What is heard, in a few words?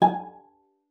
cello pizz G#5; 90 s Sample CDs; Xsample VOL-5